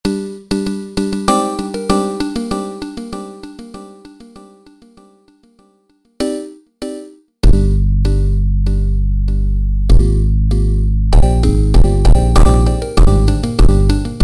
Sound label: Funk instrumental Super Slowed